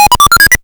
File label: Hard PCM Chip; chiptune